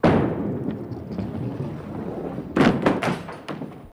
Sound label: Bowling Ball Rolls Down